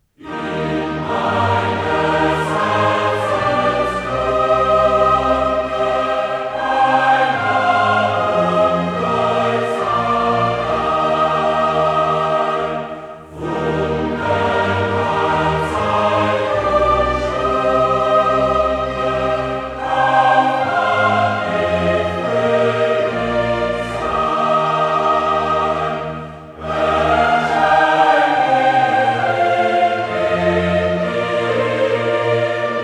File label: Chorale; In meines Herzens Grunde; Bach Johannes-Passion BWV 245; Karl Richter (conductor)